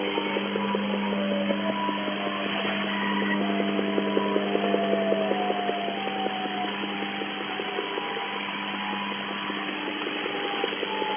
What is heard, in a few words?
Navy 5453kHz USB; TUR